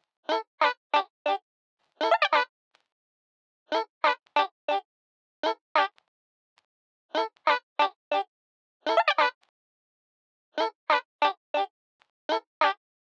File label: vinyl 1930